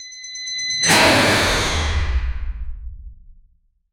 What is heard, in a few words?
slide stop sound ar 15